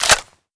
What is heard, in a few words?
m14 mag out; hunger